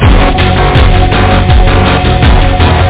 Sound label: CHORD